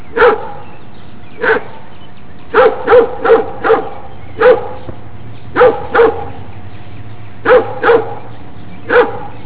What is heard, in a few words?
bark; midi; Babydog